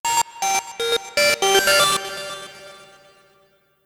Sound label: Achievement; rymdresa